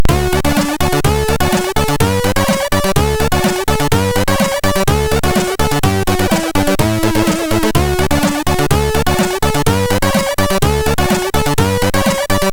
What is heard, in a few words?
Bompi Fraggle sid MOS6581R3; hvsc